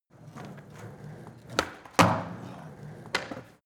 skateboard indoors drive start-up

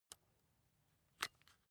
rock drill head remove soft; maschinen; bohrer; soundpool

